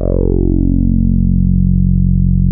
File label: TAURUS A2, synthesizer; Hollywood Edge - Giorgio Moroder Rare Synthesizer Collection